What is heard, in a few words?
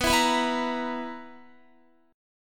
B Major 7th Suspended 4th, Guitar chord; strummed; x,14,14,16,16,17